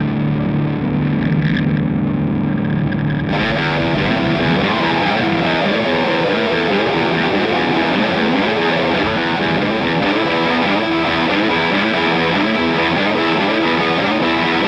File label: Maybach Lester Junior Double Cut Bridge Fuzz